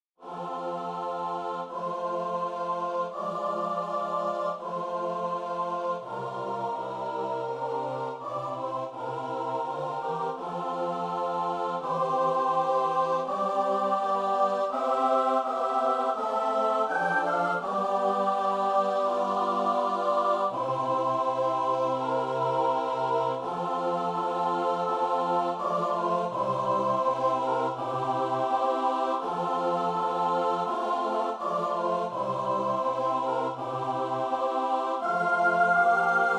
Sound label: midi; weber; modlitwa